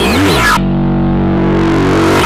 Metal Synth Bass; Sonic Foundry (Sony Creative Software); Knutrix Drum & Bass

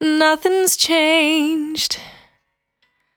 Cry 68bpm B, Vocal; Zero-G

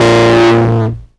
ACID BASS; AKAI S6000 CD-ROM - Volume 1; ACID LINE

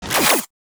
Speedy, cartoon; Classic SFX; musicradar; cartoon caper

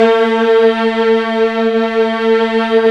STR SLOW, Vlns; Roland - String Master Series

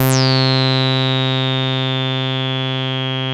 SAWREZO, BASSES; Masterbits - Vintage Voltage; VV BASSES

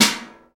trash, Trash Tuned; Roland L-CD701; Trash+Kitch

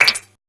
bubblez hi, cartoon sci; Spectrasonic Distorted Reality 1

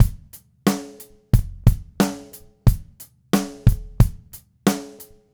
Red Maple Groove 20-inch Kick 90; 2002 DW Red Maple Kit; 90 BPM; Sonic Foundry (Sony Creative Software); Vital Drums

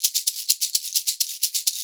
SHAK, Percussion Loops; USB Soundscan vol.36; AKAI

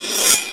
SPRING; PERCUSSIVE; Rarefaction; Poke in The Ear With A Sharp Stick

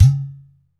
UDU; AMG - Now CD-ROM (Roland); Rhythm Of Life

